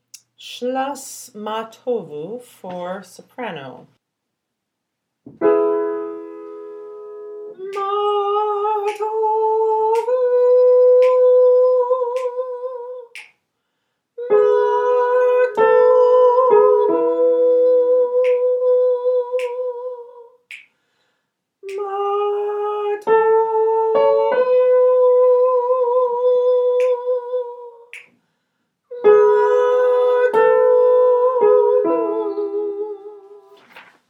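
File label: Ma Tovu soprano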